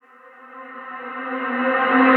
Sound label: vocal gas C; SD AURORA Sample Pack; Slate